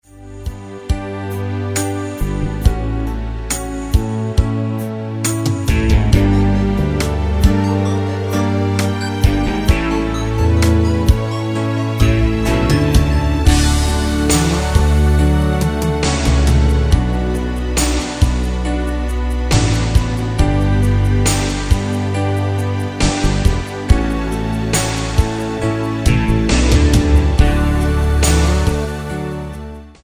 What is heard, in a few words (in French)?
Pleurer instrumental